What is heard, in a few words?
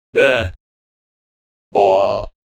short out of mana sound